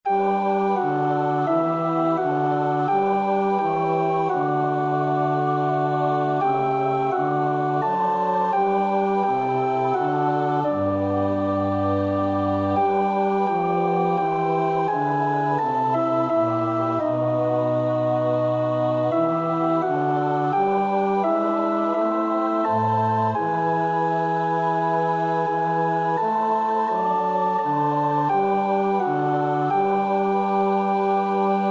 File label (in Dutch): alt, koorpartij oefening; Wolf (Ernst Wilhelm); Jesu deime Passion